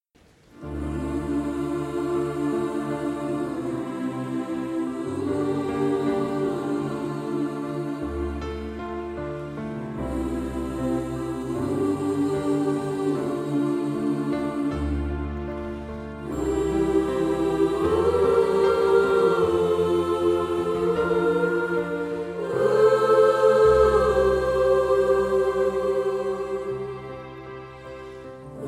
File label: Pop Medley 90er, gospel